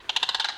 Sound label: crane; ambient; anubis